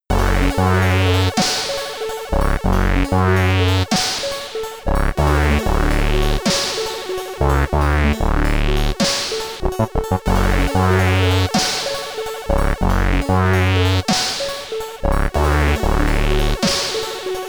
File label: Loading Tune, SID Stereo; B-Bobs; Commodore 64